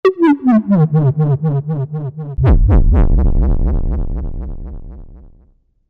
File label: DnB Vertigo Bass; UA Masterclass; drum and bass